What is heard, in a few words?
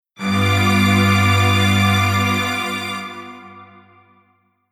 Strings Wasei